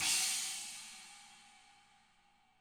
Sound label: CHINA 20, CHINA CRASH; ILIO; Double Platinum Drums 2